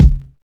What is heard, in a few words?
short bass drum e key, bass drum single shot tuned to the E note; kick drum samples